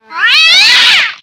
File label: cat, halloween; Source Engine; tf